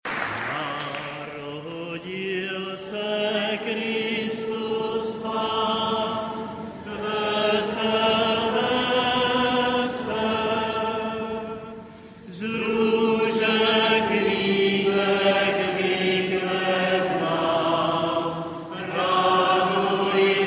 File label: hled male jezulatko; Narodil se Kristus Pán